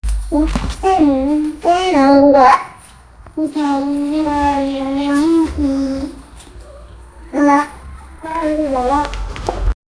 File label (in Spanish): hace un sonido para camin, walk